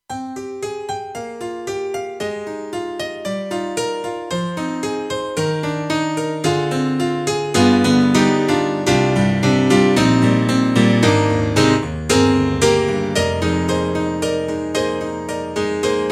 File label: KORG TR-Rack Dance Piano